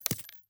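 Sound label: ui gold obtainment; Shelter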